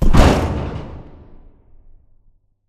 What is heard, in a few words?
Large Concrete Wall Stop; Titanfall 2; Boomtown